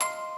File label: musicbox